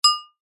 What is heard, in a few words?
household crockery ceramic hit with fork; zapsplat